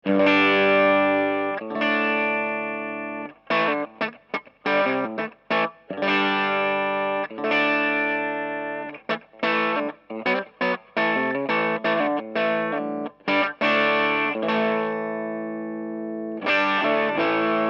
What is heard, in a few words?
full gain sw mitte